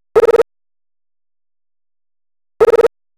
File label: Pac-Chomp FX; Sonic Foundry (Sony Creative Software); Club Joints and Street Anthems